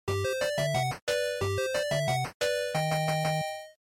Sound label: NES Action Start